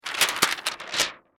Cellophane Move, Foley, Gel, Movement, Cellophane, Color, Correction; Crackling; SoundEffects-BlastWave